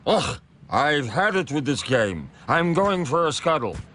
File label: scuttle; Zoidberg